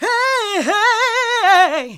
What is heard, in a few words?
GOSP. Singin